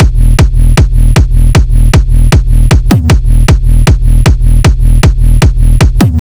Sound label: kicks loop 155; GhostHack